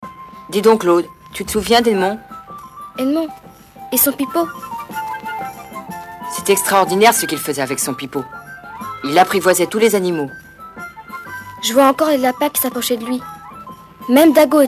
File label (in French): edmond et son pipeau